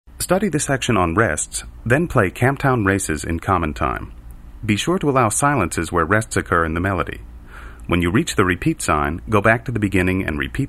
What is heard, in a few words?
camptown, harmonica